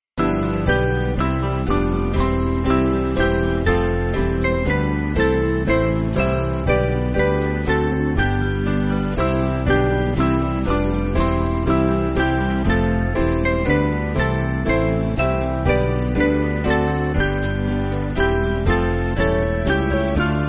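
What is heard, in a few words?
Music Backing Files for Churches; O Sleeping People; Lindeman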